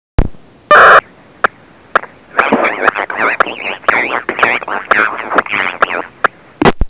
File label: rolling